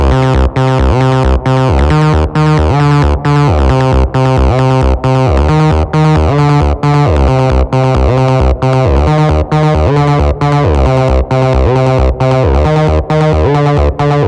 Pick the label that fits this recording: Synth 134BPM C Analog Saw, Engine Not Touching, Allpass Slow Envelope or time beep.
Synth 134BPM C Analog Saw